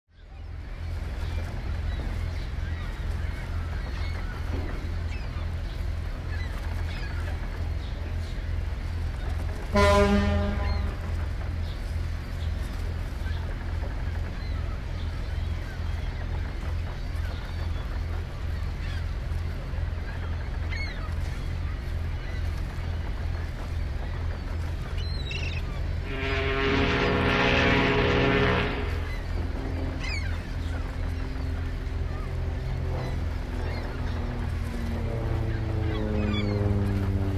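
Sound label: ship port signals environment sounds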